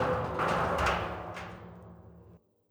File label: loot barrel gib; Rust